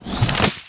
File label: e atk crossbow